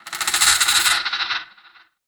Lurker Sniff; Evolve; Gorgon

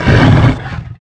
pain; Necromancy

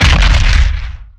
Impact Swt; Evolve; Meteor Goliath

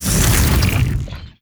strider leg stretch; Strider Remake

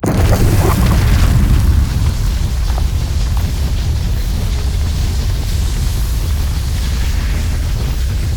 Mortar Cannon Flame Puddle; Evolve; Behemoth

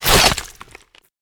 Web Shot; Lurker; Evolve; Gorgon

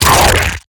Exertion Light; Evolve; Gorgon

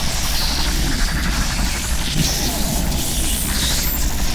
Lightning Strike Aim Loop; Evolve; Elder Kraken

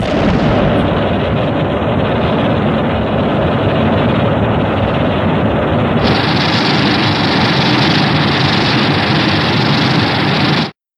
Thermal Flame; Shin Godzilla

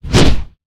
Melee Whoosh; Evolve; Goliath

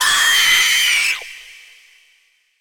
Spider Trap Emerge; Evolve; Gorgon

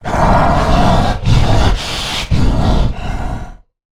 Harpooned Breaths; Evolve; Goliath

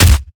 Collisions Melee Impact; Lurker; Evolve; Gorgon

